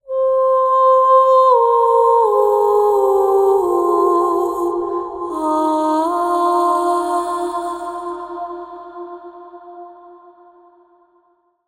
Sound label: Texture Vocals Outro - Fm 80Bpm (WET); Construction Kits; Ghosthack; SkyRealm; Kit 08 - Into The Light